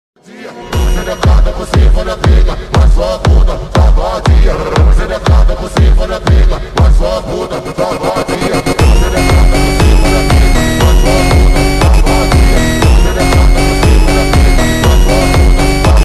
slowed bass bassboosted funk music phonk song slowed